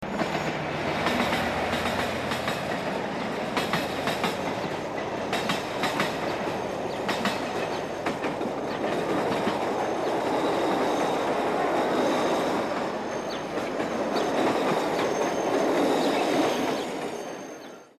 Zug

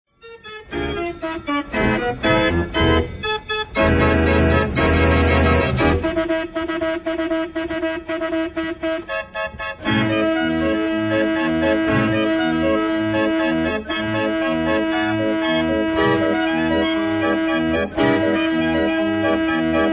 Kirmesorgel

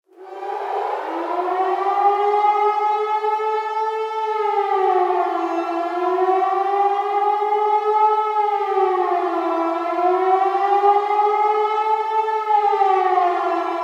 alarm sirene

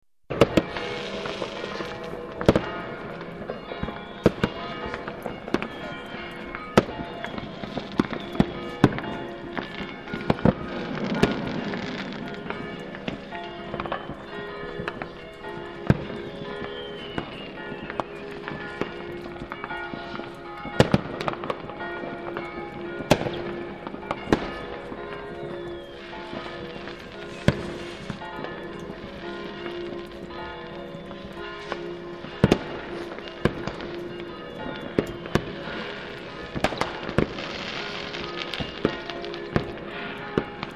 Silvesterfeuerwerk

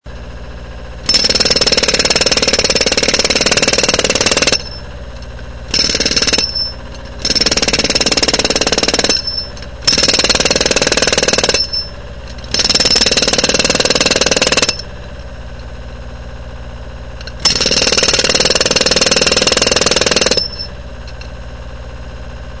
presslufthammer

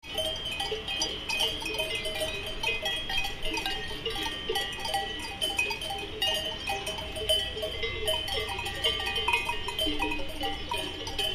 Kuhglocken

